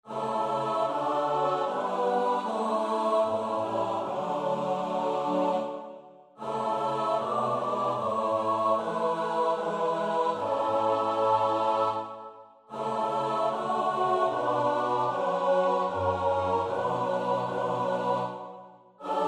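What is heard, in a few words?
soprano alto tenor bass; Bach (Johann Sebastiaan); BWV 163; Führ auch mein Herz und Sinn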